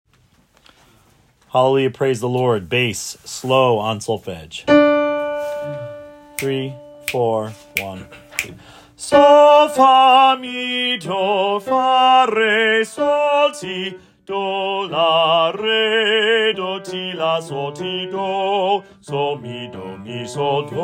Hallelujah Bass slow Solfege

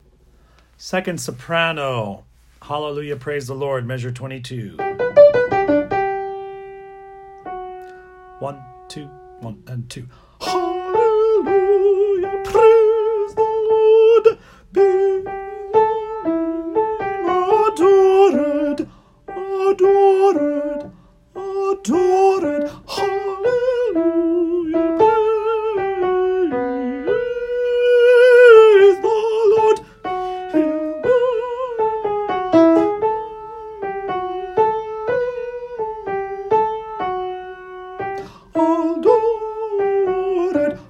Sop 2

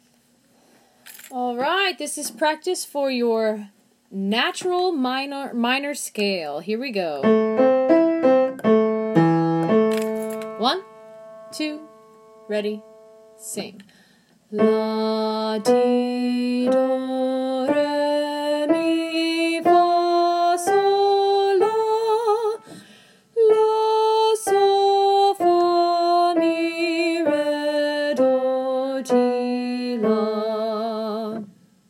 Natural minor

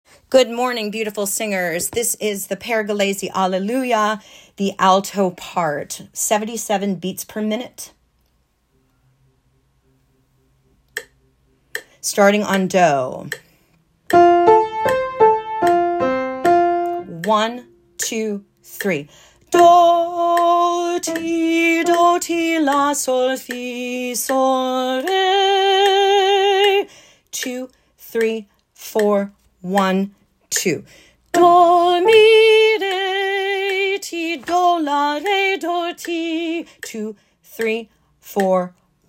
Alto Alleluia Slow on Solfege

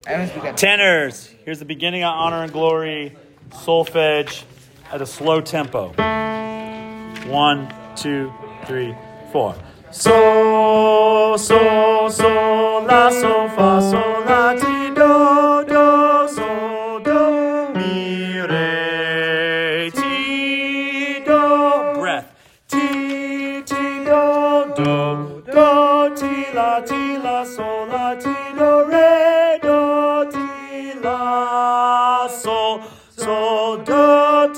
Tenors honor and glory slow first 17 measures; Wando Choir Class Placements

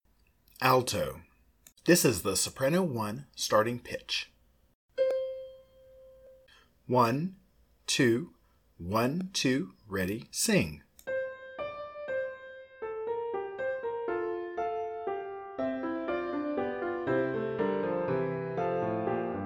Alleluia alto prompt

